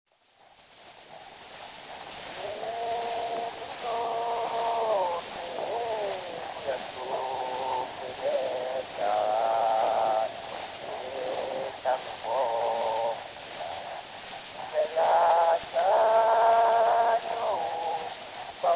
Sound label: Zulu recordings; Father Franz Mayr